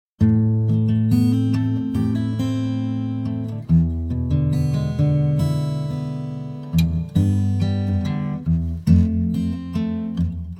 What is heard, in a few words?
Instrumental